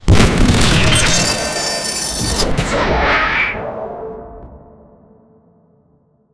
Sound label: attack